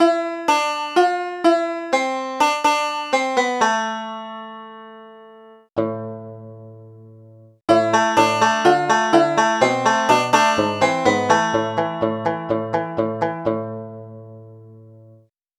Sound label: banjo